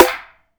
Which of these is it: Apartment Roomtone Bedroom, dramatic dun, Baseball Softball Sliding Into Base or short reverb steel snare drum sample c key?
short reverb steel snare drum sample c key